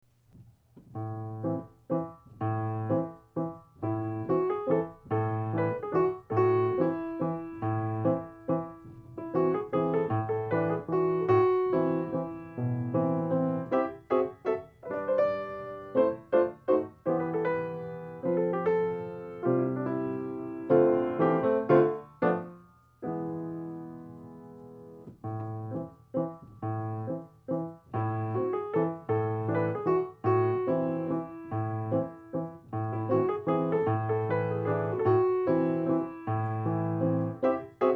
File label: waltz